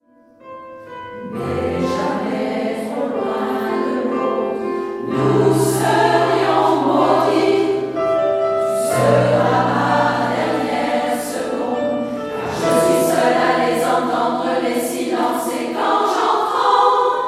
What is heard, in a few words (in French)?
Chorale; Chorale de REDESSAN; Caissargues